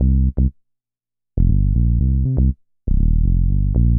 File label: BASS, DANCE; MAGIX